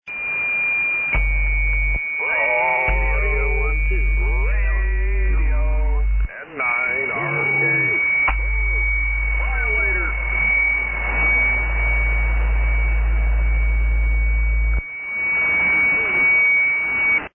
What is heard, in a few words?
SSB Net Tune Up